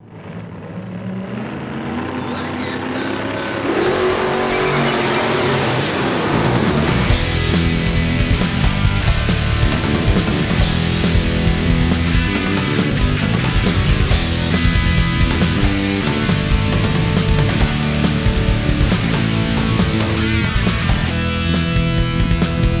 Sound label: karaoke